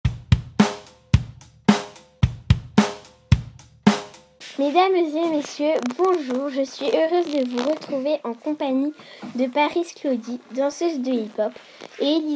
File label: hip hop